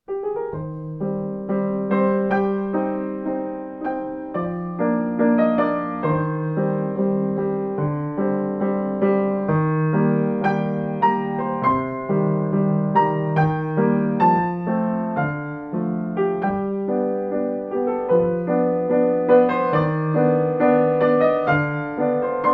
Piano Scarborough Fair; Dream Drama Main 15; クラエスのピアノ

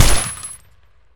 PPSH; MILITARY1945

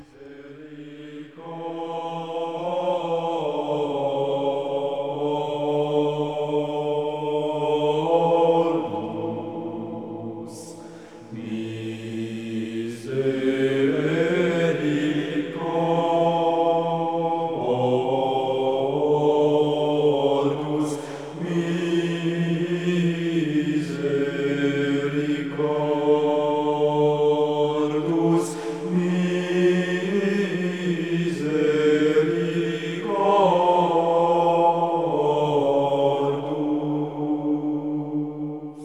Singing; l ange et le demon cd i gamerip 1992

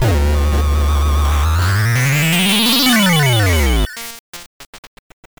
Morph Defeated; animorphs gbc

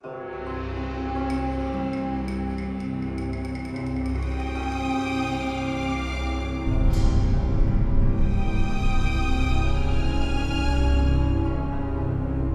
Background Music; Platoon

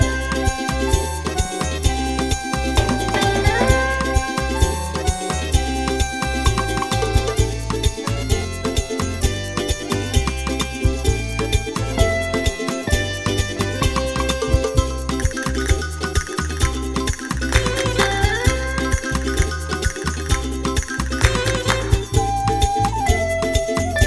Loop; rakoo friends wii u 2015

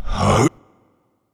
SFX argh; wickerman

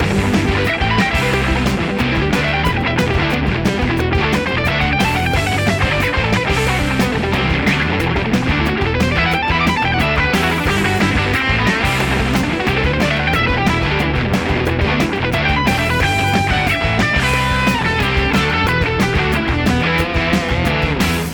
BGM Game Over; Blade Strangers

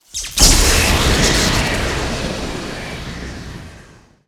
Warp Start; Wario Land: Shake It!

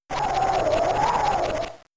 Sand Tomb; pokemon; gen 3; attack moves

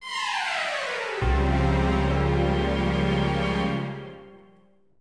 Jingle Battle Lose

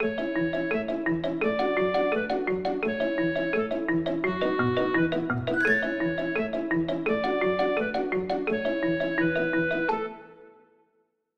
Upbeat Theme; My Friend Peppa Pig; PS4 PS5 Stadia Switch Windows Xbox One Xbox Series XS gamerip 2021

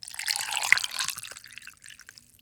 pour water

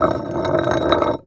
SCRAPE Concrete Slab on Concrete Slab Medium mono; Don't Be Afraid The First Toy

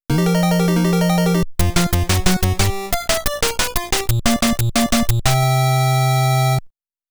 pattern clear BGM; konami famicom chronicle vol.1 disk system edition

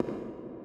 footsteps generic

